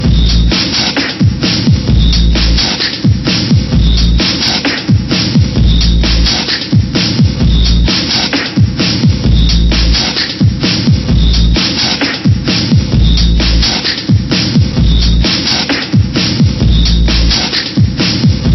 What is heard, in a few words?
Ditty; Fox Sports NBA Basketball 2000; PS1